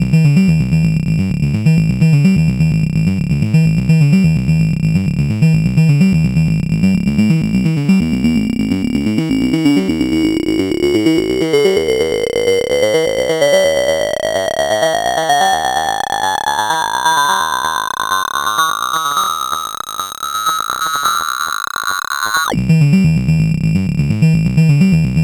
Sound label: Random Loop Band Pass Filter